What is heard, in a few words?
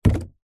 industrial power steering fluid in plastic bottle set down garage floor